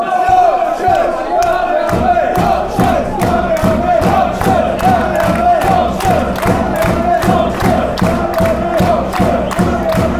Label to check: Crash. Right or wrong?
Wrong